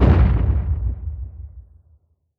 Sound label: flashbang explode distant; weapons; Counter Strike Model Pack; CS2